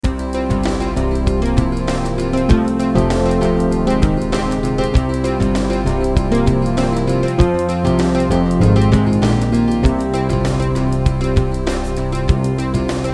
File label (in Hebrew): יפה שקט